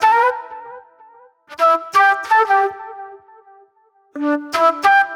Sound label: Sharp Delay Flute